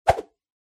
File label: Motion Swish